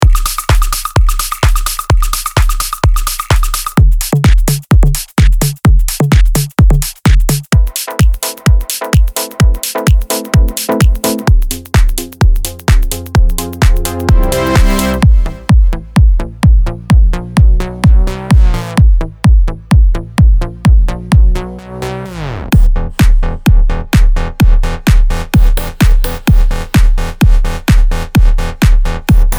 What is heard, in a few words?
kicks jingle extended; kiholm